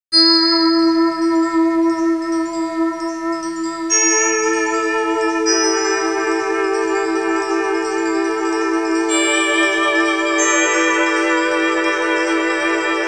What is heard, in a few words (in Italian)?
musica newage